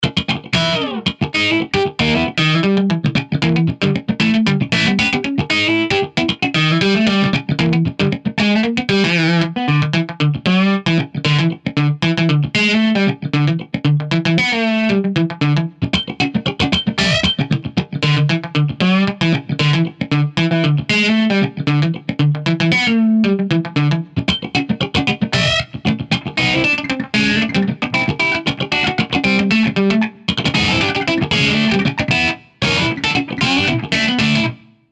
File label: Funky Blues Solo, Jazz Guitar